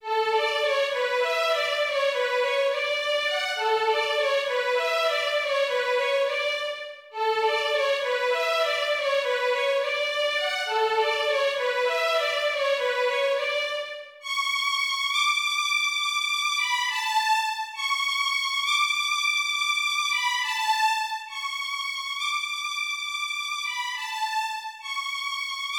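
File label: Song of the Reaper ensemble Violin 1; Schumann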